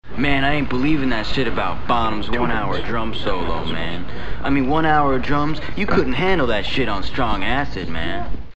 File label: drum solo